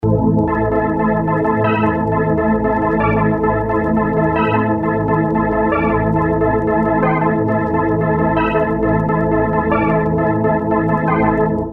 synth, Emu planet phatt; Synth prodigy; a Motorola MC 68000 CPU (mc68hc00fn10) system handles up to 32 voices of polyphony

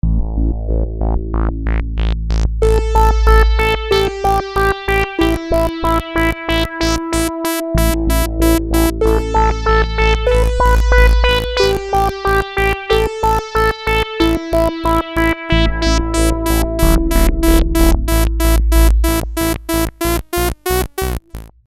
SYNTH acid seq, Roland JD800

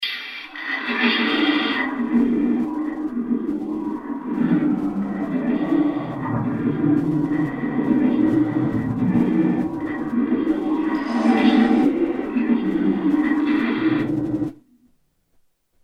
fx noise demon, yamaha sy99 synth

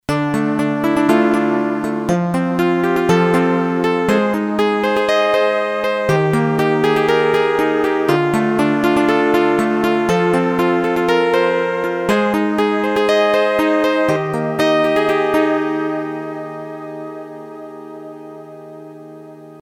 piano, Roland MT-32

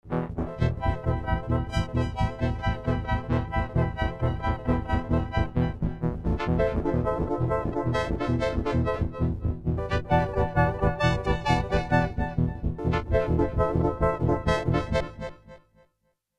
vector pan, Yamaha TG33

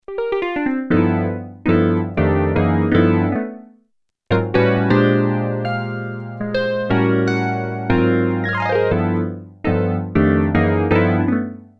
piano, synth Roland alpha juno

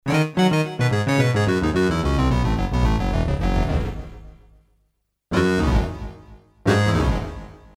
yamaha tg33

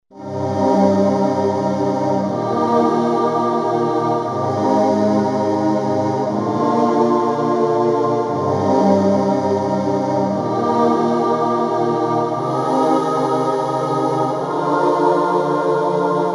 korg m1r synth

